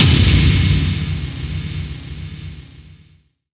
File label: POW; XXL 800 Production Effects